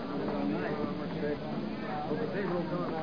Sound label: people